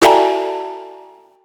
不安

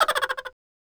笑い

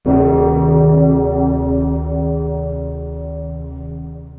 鐘 低音

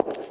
ashioto hall

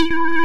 ひゅるる

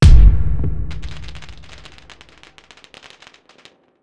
打ち上げ花火爆発; ゆめ2っき

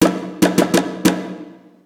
ポンポン

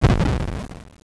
bokaan

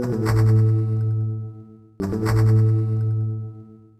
屋敷内BGM; ゆめ2っき

